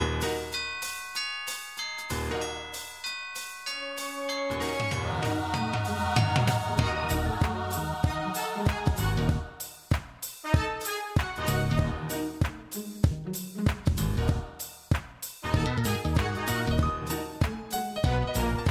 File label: Music; A Christmas Blessing launch revised